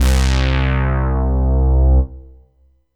Yamaha EX5 Ana Brass C2

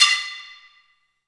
Bottle

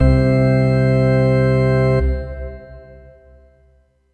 Yamaha EX5 Cathedral C4

